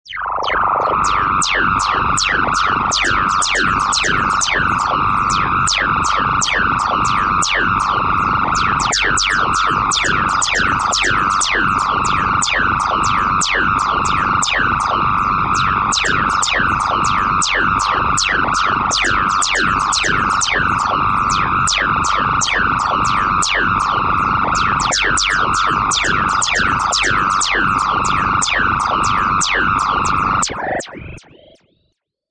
Crazy Lazer Mosquito, Deep Tone; Deep and creepy evil bass sound LOW FREQUENCIES: USE HEADPHONES OR SUBWOOFER TO DEMO; Deep Tones

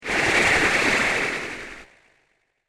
Magic Fire Blast; Magic Sound Effects; fantasy sound effect; Andrew Fleming

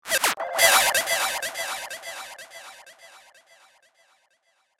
Twisted Theremin; Miscellaneous Cartoon Sound Effects

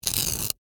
Crunch Rip Tear, Floral, Foam; Texture; BlastWave

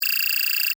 Data Readout Text, Typing, Beep, Scifi; Science, Fiction; Tech Sound Effects; Blast Wave

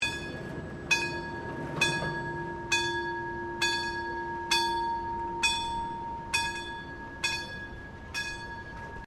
Train Level Crossing Bells; EMERALD LAKE; Transport, Vehicles, - Vehicles - Artificial

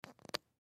Chap Stick Cap Replace Smooth; Chap Stick Cap Replace Smooth Small Plastic Tube Lip Balm; Primping; Foley

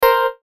chime electric keys; Multimedia; Chimes; Blastwave

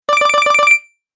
Game Collect Your Bonus, Video Game Sound Effects

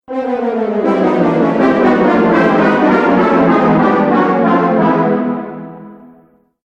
Falling Brass Fanfare, cartoon music

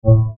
Tonal Warp; Trailer; Sound Design

